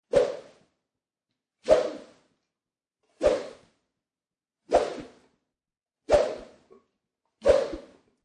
Swish Whoosh Swoosh Sounds, Six separate karate swishes, sword swish; karate swishes, sword swishes or other object swoosh or whoosh through air.